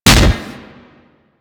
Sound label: Impact Metal, Weapons; Warframe; Furax